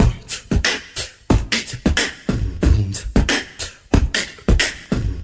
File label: boomchk clack, Beatbox; Drum loops